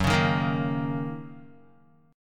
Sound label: Fsharp 6th down Guitar; strummed; JGuitar